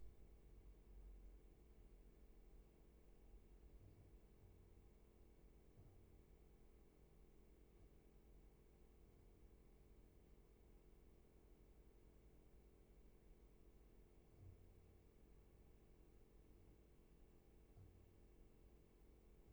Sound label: Room tone